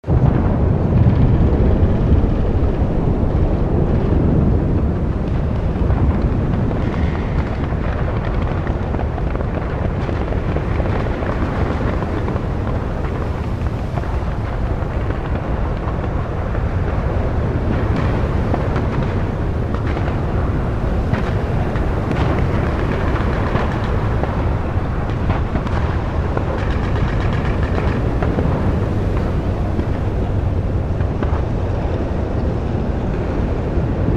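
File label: Ambience Bunker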